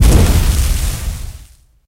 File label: hit diesel